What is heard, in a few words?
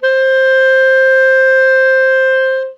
clarinet C octave1